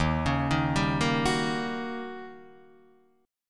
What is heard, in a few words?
Eb 7th Sharp 9th, Eb, Guitar, Open D